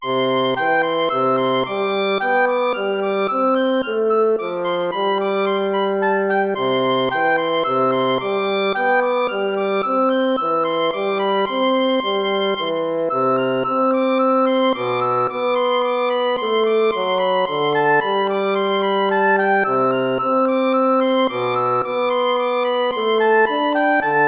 tempo minuetto; hook james

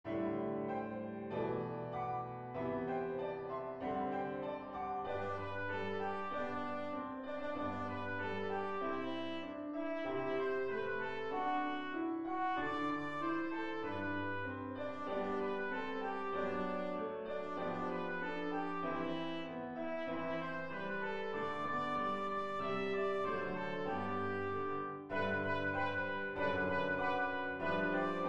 jingle bells; pierpont james

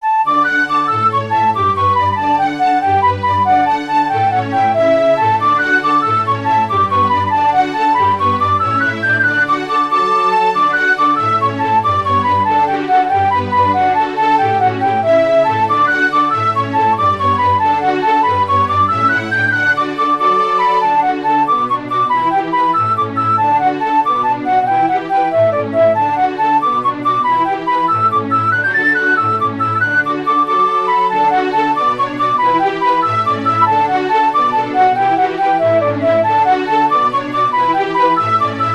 jig for flute strings; anonymous the humors

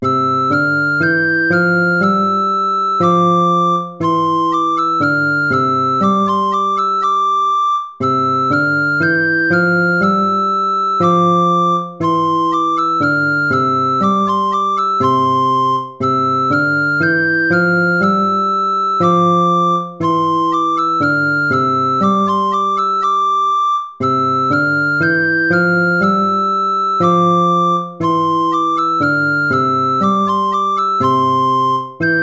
gavotta; hook james

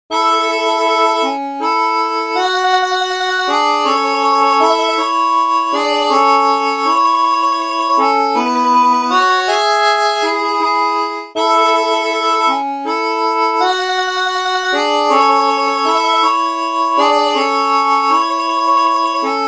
harmonica mandoline

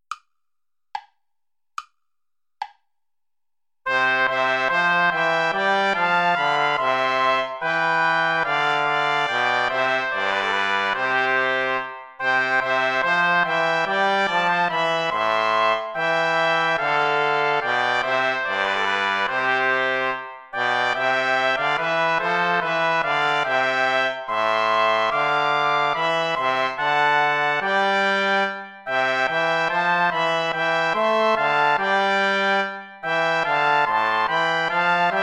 play along without trombone; praetorius michael; nach gra ner farb mein herz verlangt

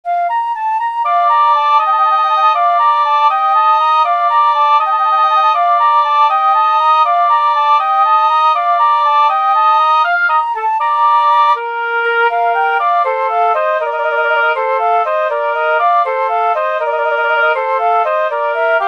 for flute oboe

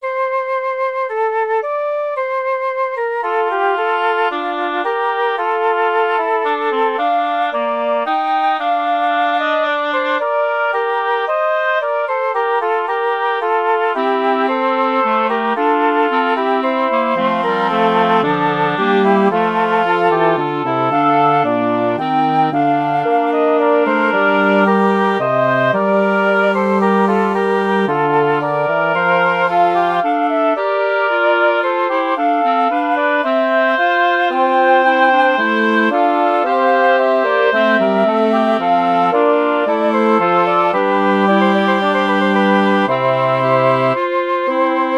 ricercare for woodwind quartet; palestrina giovanni pierluigi da; quinto tono

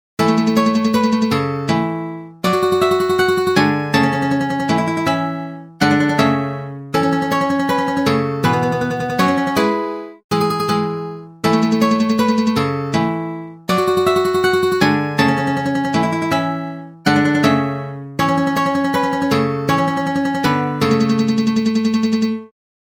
canticle lacrimosa mandoline

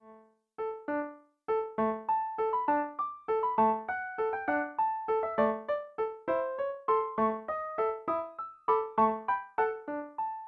light waltz for piano hands

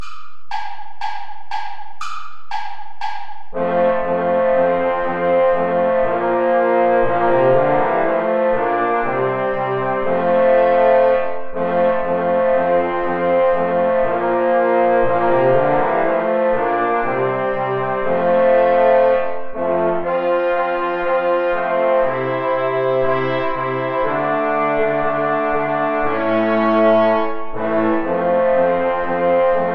play along without trumpet; steuerlein johann; mit lieb bin ich umfangen

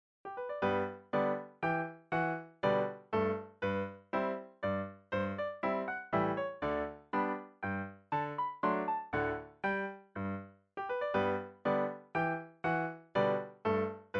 menuet in g

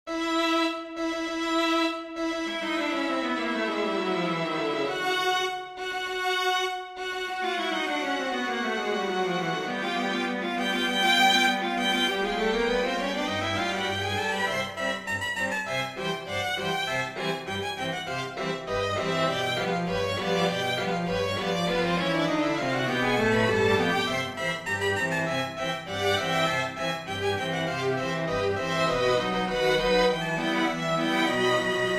for string quartet; fucik julius; entry the gladiators thunder blazes

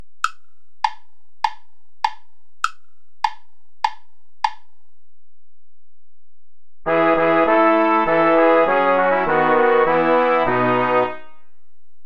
play along without trumpet

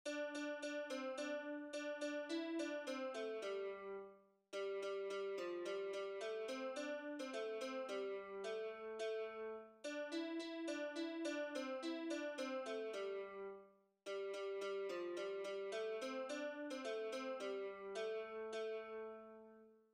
japanese traditional; ume ga e

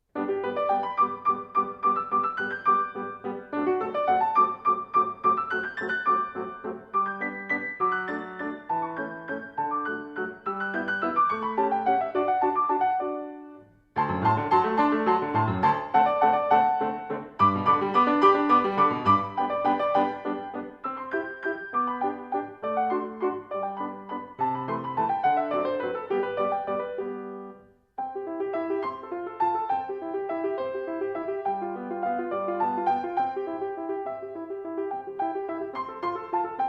etude major allegro tempo valse

